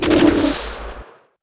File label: Armor Shed; Weaver